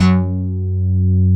PPG BASS

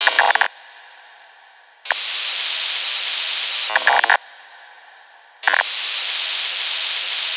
Cosmic Radio; Zero-G - Future Wave

